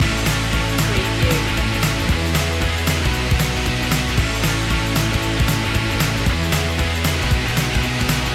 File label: rock me indie loop